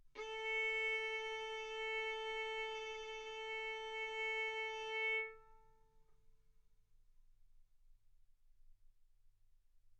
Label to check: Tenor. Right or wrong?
Wrong